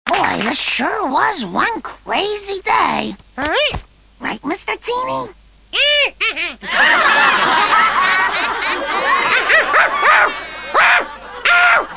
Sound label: error